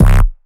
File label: Dark Wham; Noises; VEH4; Synthetic's Serum Collection